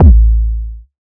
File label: Kick Deep Hard Bass Kick A4